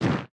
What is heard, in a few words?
mech armor land bark; Factorio